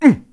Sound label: Lift; Link lifting an object